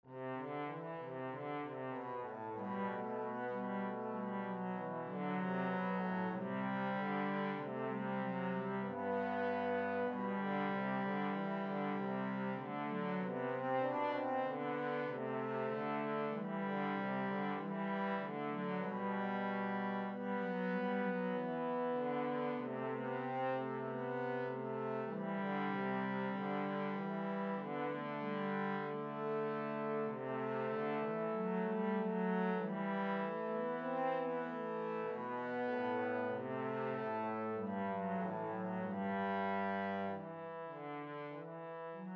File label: Trombone polyphone